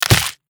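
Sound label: attack pincer, creature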